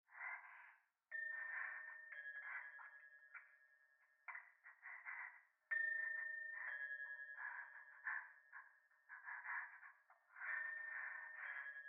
トイレ 右耳快感吐息 神社

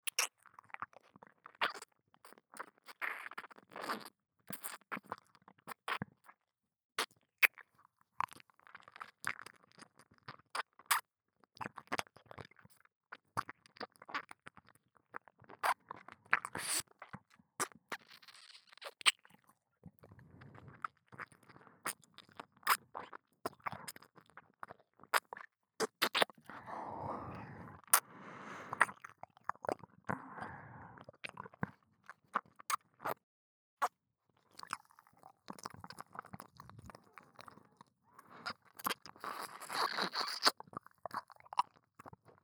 右耳舐め, ASMR

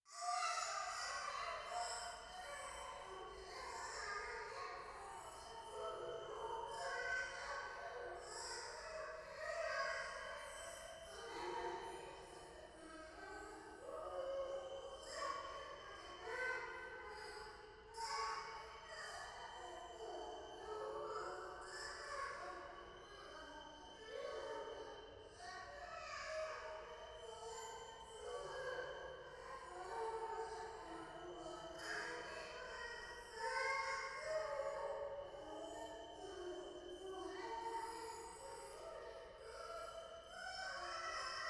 夢の中 シャブ喘ぎ 山奥

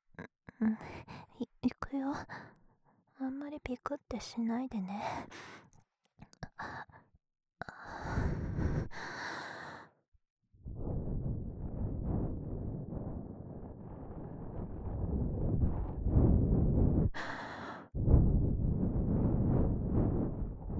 右 耳ふー, 環境音なし; 耳かき小分けトラック【環境音なし】

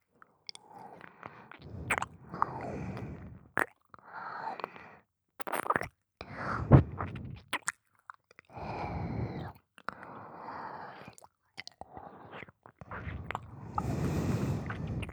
耳舐め, ASMR

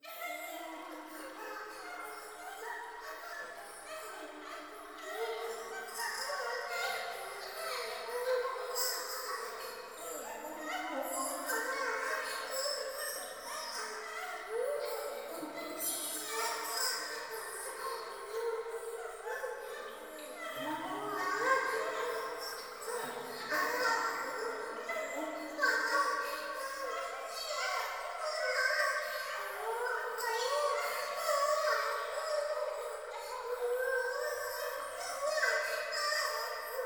トイレ 喘ぎ 川 の せせらぎ

